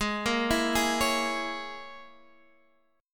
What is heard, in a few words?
Db Minor 6th Ab